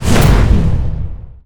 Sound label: Ui Ingame Countdown